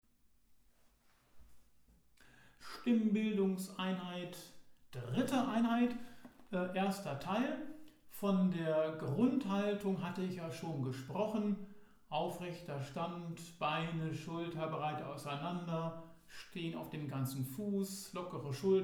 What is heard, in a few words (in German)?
Stimmtraining